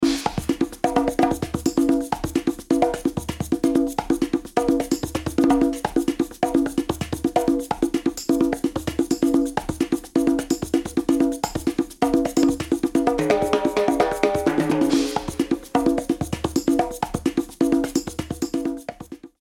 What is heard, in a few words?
Samba, Latin Percussion